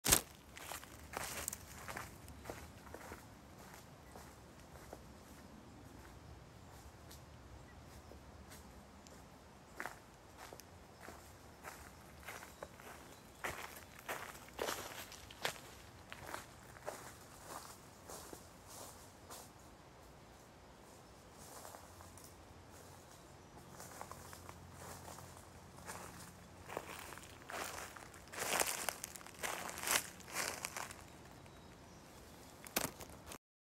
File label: Walking on Forest Floor